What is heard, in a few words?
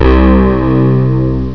Bonus; Brickles Deluxe v1.1